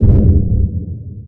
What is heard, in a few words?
boomin, aircraft combat; Cosmic Rage